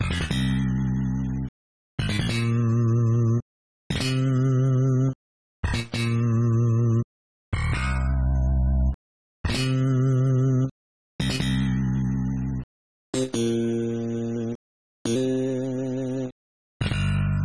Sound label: instruments; Mother 3; Duster's